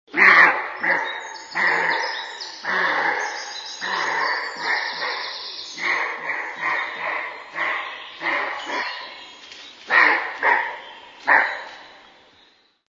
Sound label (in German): Rehbock Schrecken, haarwild